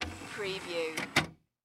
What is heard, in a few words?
DVD Player Tray Close